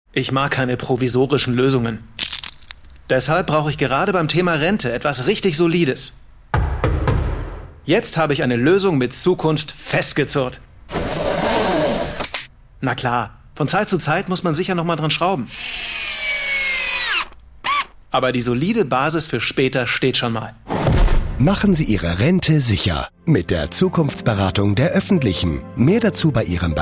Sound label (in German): Maennergeraeusche; OEVB